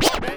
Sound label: Cuts, Vinyl Record Samples; Cuts Medium